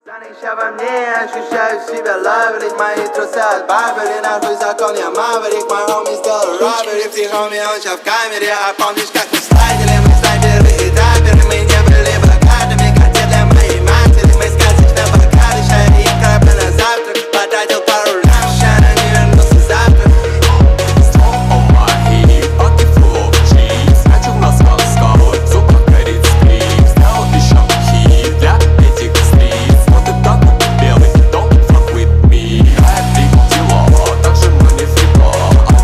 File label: phonk remix